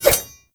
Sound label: Kunai Whoosh; Weapons